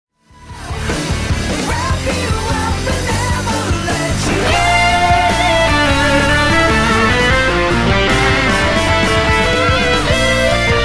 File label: toys solo